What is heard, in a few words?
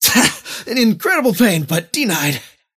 deny pain; dota; kez